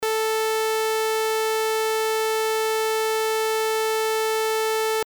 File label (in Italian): Onda a Dente di Sega a 440Hz